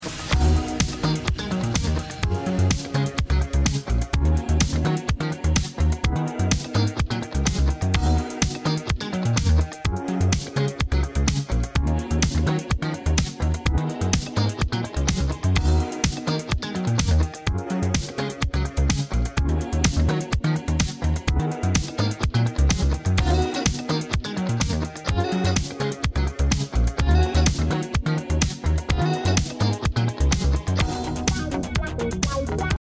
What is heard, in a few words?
Guitar